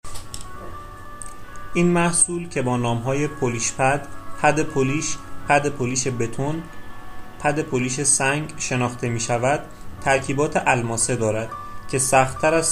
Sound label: Pad; Polish